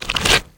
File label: spit; gonarch